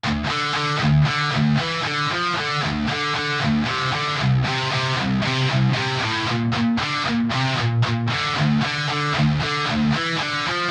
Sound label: Instrumental Guitars; nowhere station